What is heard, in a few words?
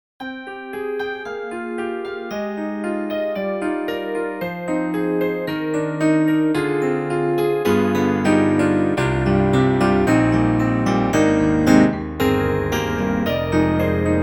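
Acoustic Piano+DX; Steinberg HALion Sonic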